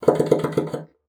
BASSOON; Xsample VOL-6

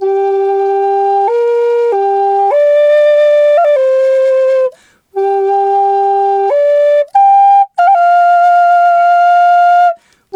G2 93, Didgeridoo; Big Fish Audio - Didgeridoo and Other Primitive Instruments

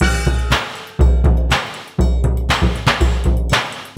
Hip-Hop Gong

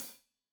Hat Loose, Hi-Hat, Cymbals Stereo

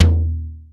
KOMMERZDRUMS

